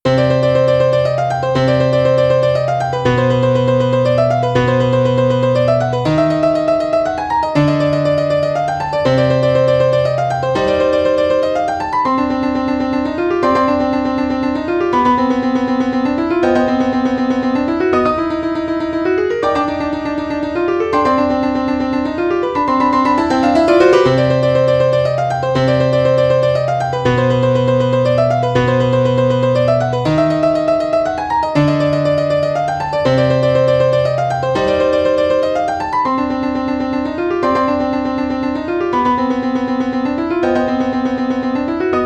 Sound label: centipede piano